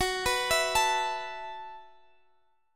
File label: E Suspended 2nd Suspended 4th F sharp